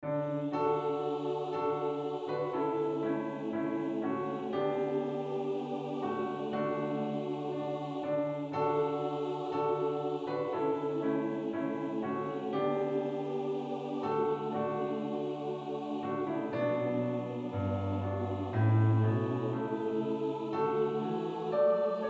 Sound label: Hymn